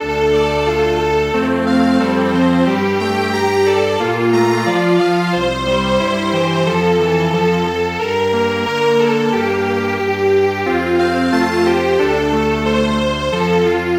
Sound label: Strings Quartet